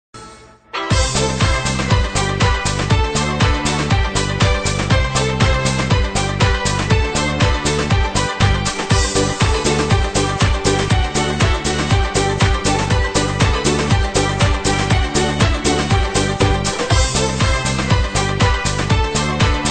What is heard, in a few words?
Lithuanian folk dance; Bitute Pilkoji